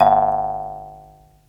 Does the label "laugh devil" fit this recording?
No